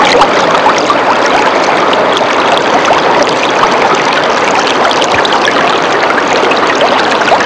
brook; Sound Effects for Multimedia - Volume 1

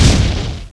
cloud; Spider-man Cartoon Maker; brushes

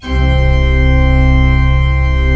Pipe Org, church; Timidity

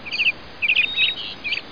Canary

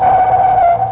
Ping; HyperBattleship!

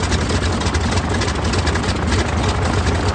tank Idle; Action; ThinkTanks

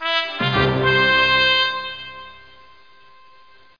Flourish; DOpus

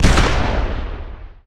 shot; fun and bullets

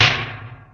impact heavy; Fish Fillets

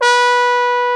bone; DirectX SDK; DMusProd

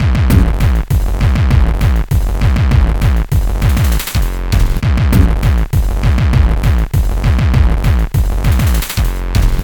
Sound Kit; Alais Kit Demo; battery

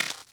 step, Moves; ageofwonder

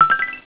new bonus; sdlroids

